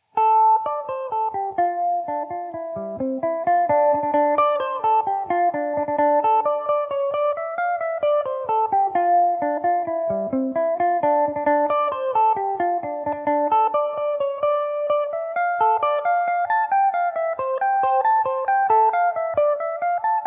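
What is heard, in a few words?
D dorian revelation, Irish mandolin; julia delaney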